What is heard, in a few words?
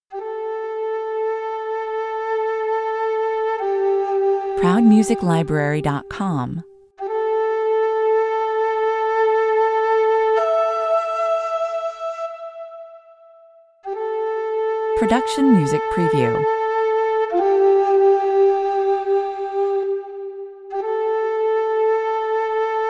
ancient temple (flute only) 70 bpm